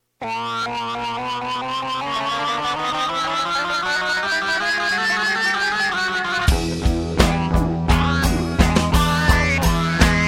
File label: Microsynth Square Wave Jam